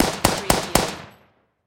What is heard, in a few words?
Machine Gun Vickers